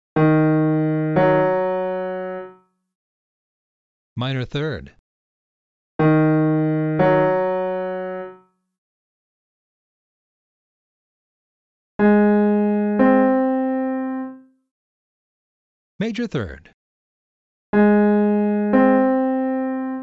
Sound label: m3 M3 ascending; interval recognition 1; Training Exercise